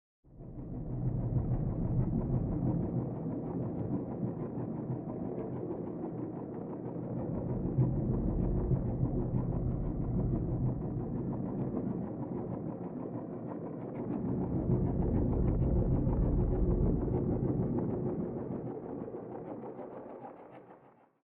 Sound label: Submarine